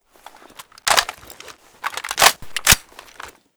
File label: aug a3 reload empty, weapons; STALKER; IWP